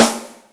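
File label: Open Rim, Yamaha DTXpress Acoustic Snare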